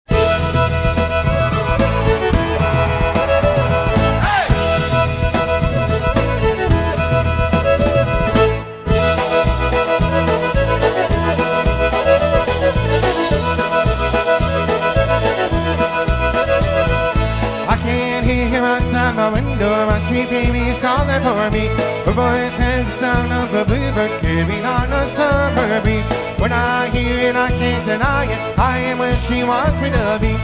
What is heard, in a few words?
Polka, Live Jam